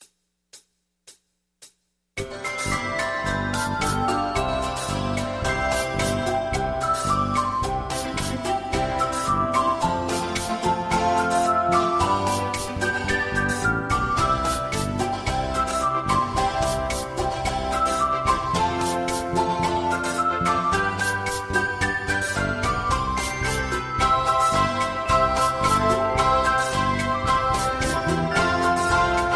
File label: Jingle Bells banjo; Christmas